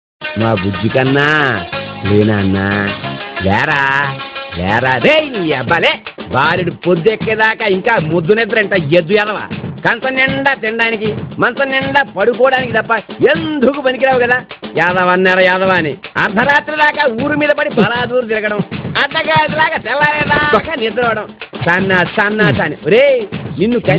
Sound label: Daily Alarm